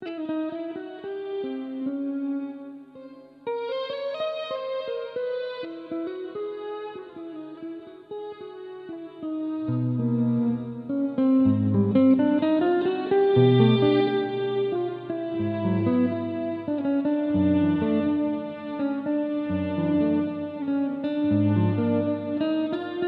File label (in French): guitare; teleport